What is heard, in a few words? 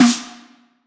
snare